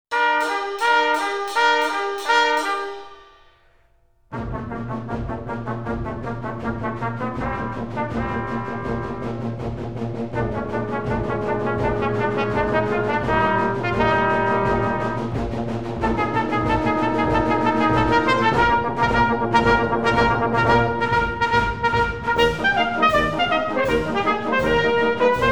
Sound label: Railroad Gallop